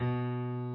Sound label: key; keySounds